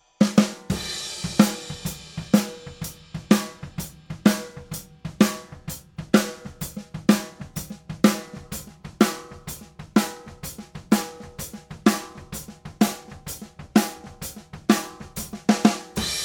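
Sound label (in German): Overheads Snare Phase, Overheads und Snare-Mics - Einfluss der Phasenlage auf den Sound; erst die optimale Kombination - dann zum Vergleich die ursprüngliche Kombi (da, wo die Snare auf einmal dünn klingt) - dann noch mal hin und her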